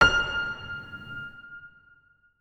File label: notes, keyboard piano; Hideout Furniture